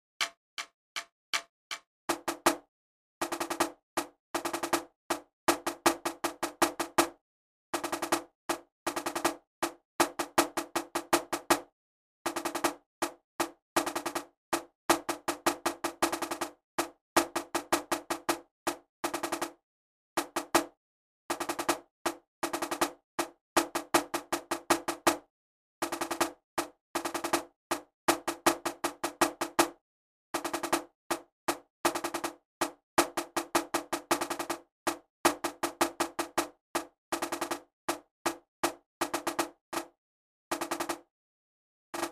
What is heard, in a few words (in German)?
Trommel [160]